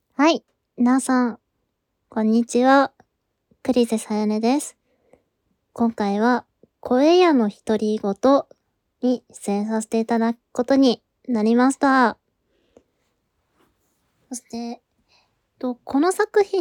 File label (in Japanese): プライベートオナニー実演; 声屋のひとりごと